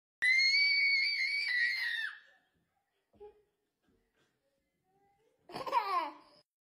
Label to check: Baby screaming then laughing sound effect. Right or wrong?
Right